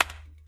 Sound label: Clap